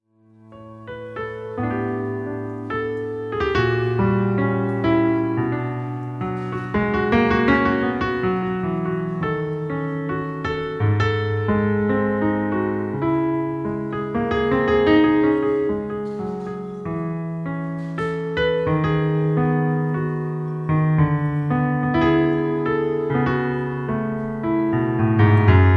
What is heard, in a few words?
Piano